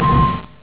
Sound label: low smash